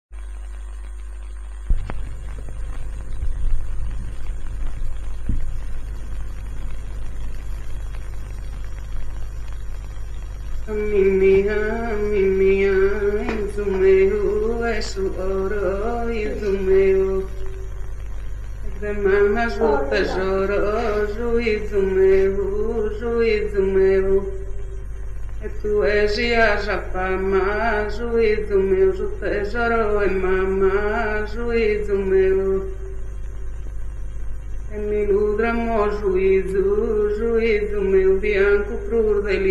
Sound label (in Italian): anninnia, canti monodici non accompagnati, orgosolo